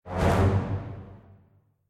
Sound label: ui ban champion; jade; champ select